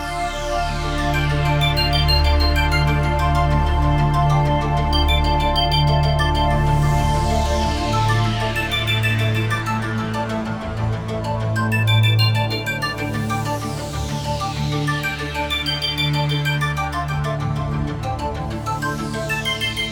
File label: Urban Jungle, sci fi